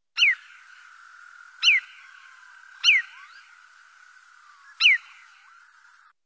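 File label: little ringed plover voice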